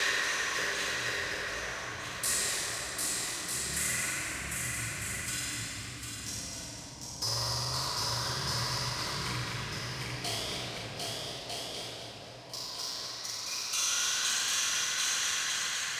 Electronic Percussion

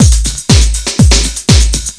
LOOP; SoundFactory; DRUMLOOP

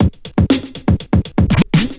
Old School Break, Drums

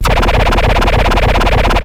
Turntables

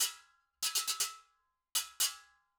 Acoustic Percussion

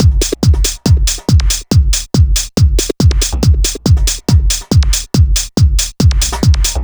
Dance Drumloop; Drums; Dance Beats

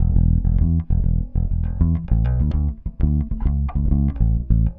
Electric Bass; Basses

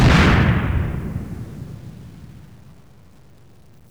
enemy explode; Deathchase; Retro

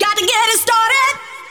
vocals; SoundFactory; SMPMANIA

